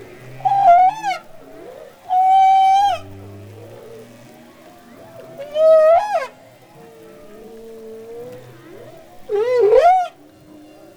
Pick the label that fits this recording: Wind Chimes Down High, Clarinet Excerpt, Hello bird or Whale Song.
Whale Song